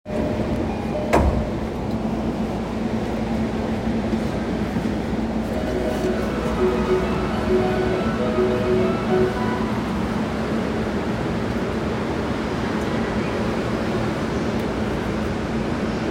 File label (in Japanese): JR中央線快速東小金井西, train sounds